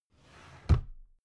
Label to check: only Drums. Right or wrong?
Wrong